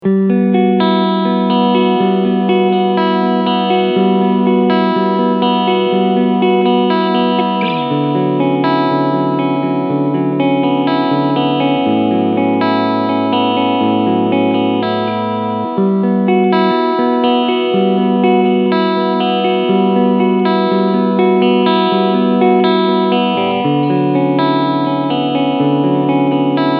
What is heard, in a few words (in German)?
Cleansound beide Humbucker